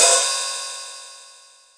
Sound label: hihat